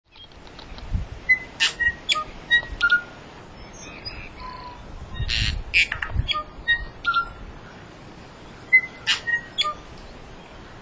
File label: Tui